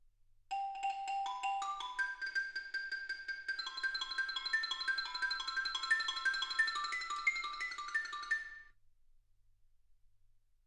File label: xylophone